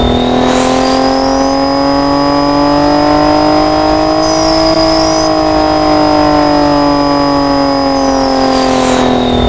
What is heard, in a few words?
v8 turbo on loop